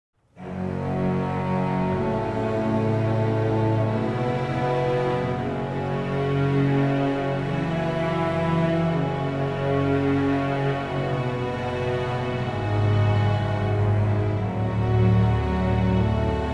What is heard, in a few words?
Triumph, Fantasy Music; Fantasy Vol 3 Music Pack